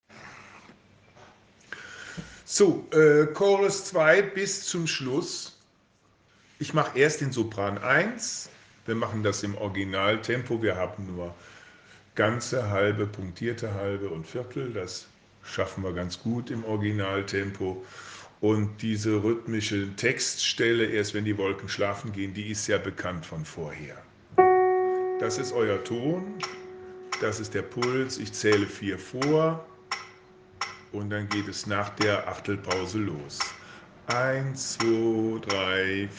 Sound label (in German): Sopran originaltempo